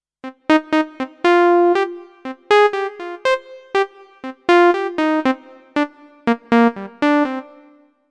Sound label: Yamaha Sonogenic SHS 500 Saw Lead